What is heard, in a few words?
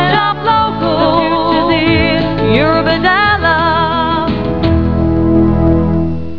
jingles